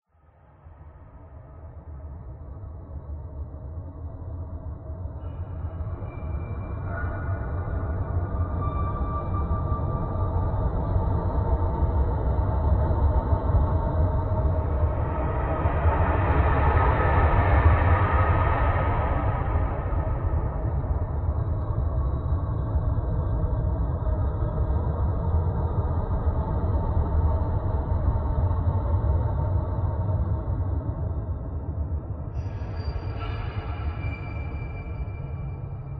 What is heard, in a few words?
Gritting Sample; The Sinking City